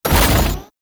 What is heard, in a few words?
Flak Cannon Charge; Warframe; UT Flak Cannon